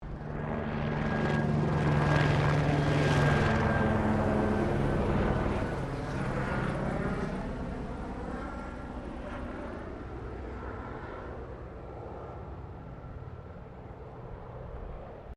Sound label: helicopter Bell 204 firefighter passing distant; Helicopters